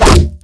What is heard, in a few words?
BEATTACK